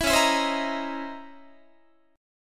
C sharp Minor Major 13th C sharp Guitar; C#mM13 strummed; JGuitar

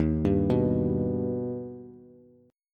Eb Augmented, Bass; arpeggiated

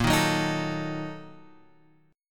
A sharp Major 7th Suspended 4th Sharp 5th; A#M7sus4#5 Chord {6 6 4 X 4 5} strummed

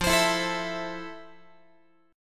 F Major 7th F, Guitar; strummed

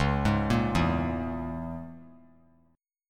C sharp Minor 6th; C#m6; arpeggiated; JGuitar

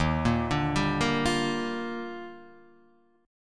Eb Major 9th, Guitar DADGAD

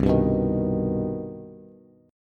C Minor Major 7th C Bass; strummed; JGuitar

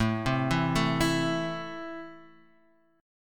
A Minor A Guitar Half Step Down, chord

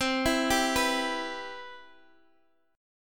C Major 7th, C, down, Guitar, Open D

